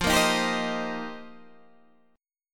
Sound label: F 7th Suspended 2nd Suspended 4th F Guitar Open D